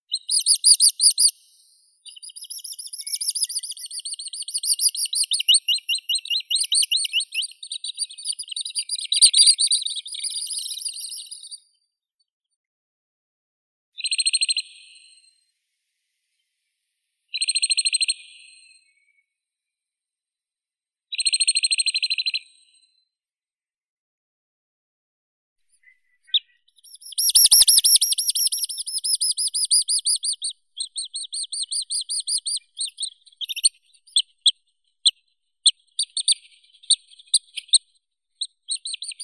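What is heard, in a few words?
little stint, Calidris minuta; kulik vorobei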